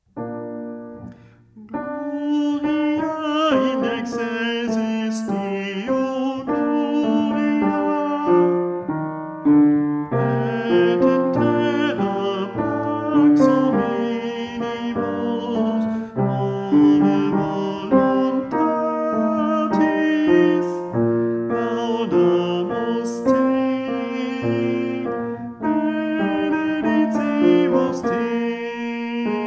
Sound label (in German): Tenor